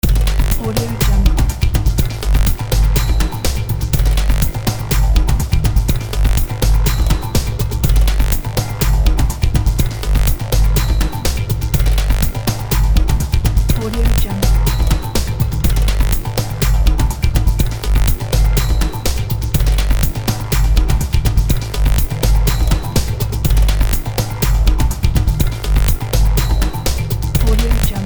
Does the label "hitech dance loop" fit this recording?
Yes